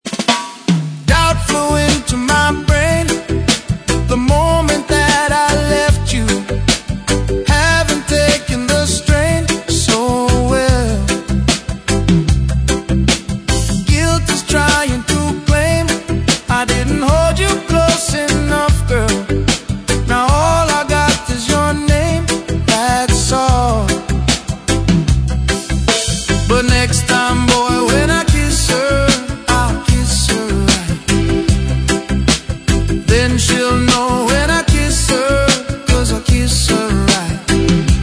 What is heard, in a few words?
Reggae